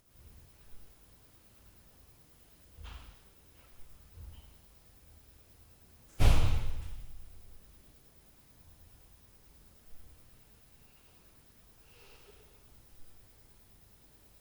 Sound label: DOOR, Night Noise